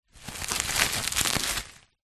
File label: bubble wrap crumple handling; foley